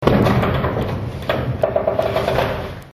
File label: door